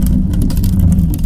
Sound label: plastic barrel roll loop; physics